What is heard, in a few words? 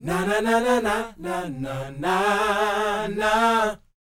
NA-NA G#; Spectrasonics Vocal Planet